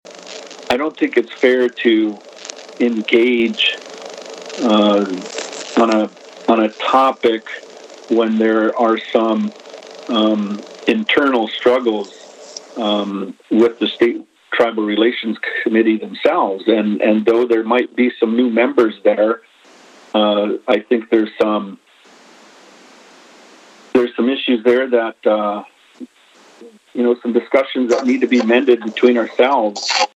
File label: Flute